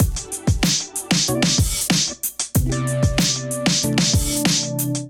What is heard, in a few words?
Gate Kit, Drums; Allee; Ableton; Factory Packs; Chop and Swing